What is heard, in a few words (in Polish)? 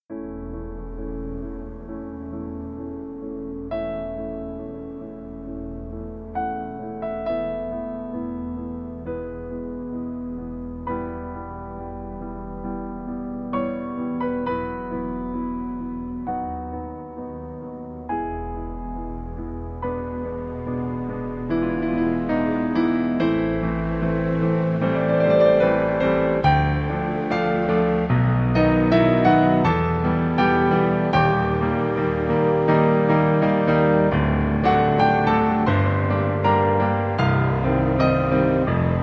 PRELUDIUM A DUR